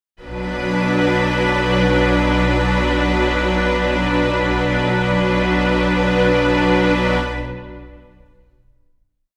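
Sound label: string chord b minor, Pads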